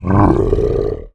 MONSTER Growl Short; Infernal Radiation

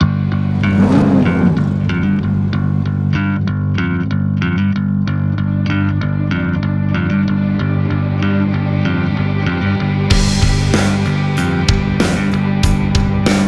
Main Menu (Rock); driver ios gamerip 2009

